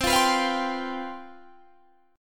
C Major 7th Suspended 4th, C, down, Guitar, Standard; strummed; CM7sus4